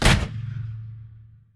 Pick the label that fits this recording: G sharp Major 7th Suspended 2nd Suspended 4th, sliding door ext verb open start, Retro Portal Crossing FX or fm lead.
sliding door ext verb open start